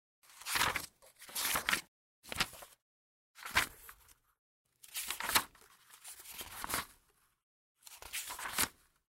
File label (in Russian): zvuk perevorachivaniya stranic